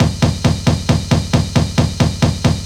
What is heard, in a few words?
Drum Fill, Cinematic Funk; Sonic Foundry (Sony Creative Software); Urban Tone Poems